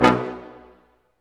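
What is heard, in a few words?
BRS, Brass Ensemble stac; Roland L-CD702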